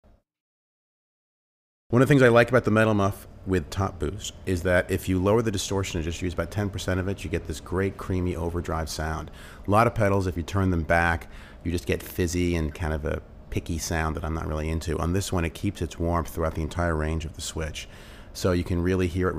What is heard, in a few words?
metal muff rolled off